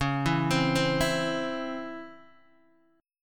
Db Minor Major 7th Db Guitar DADGAD

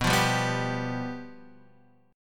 F Suspended 2nd Flat 5th B

